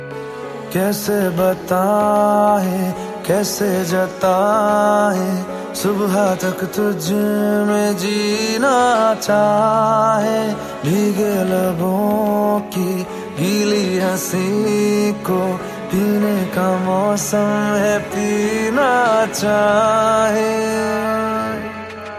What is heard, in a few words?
Romantic Hindi Ringtone